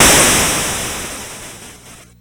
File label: C64 Explosion; 8-Bit; Chiptune; Commodore 64; FX